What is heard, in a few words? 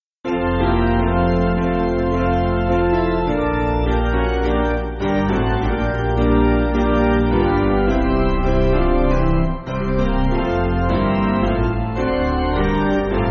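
Piano, Music Backing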